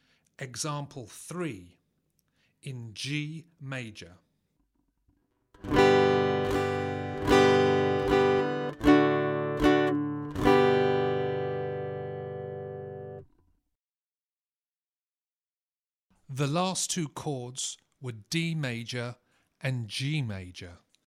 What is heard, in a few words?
Aural Harmony Test, acoustic guitar; Grade 5